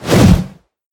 swing whoosh

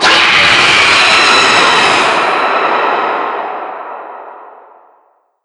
caverns scream; doom3

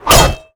blade

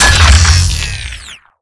gauss siege stop; doom gauss

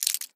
pick movement, lockpicking

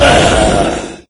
pain, lostsoul; doom3

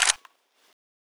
ak47 clip out; weapons

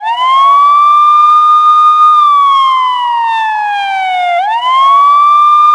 siren, police; gta3

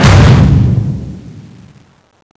cannon, holy sword; tfa cso

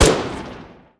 tiger mg; weapons

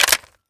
mag in, weapons; mas scar; cw20 extras

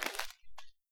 quikclot retrieve; ifak; weapons

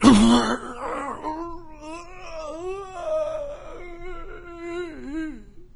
zombie death; doom3; fatzombie

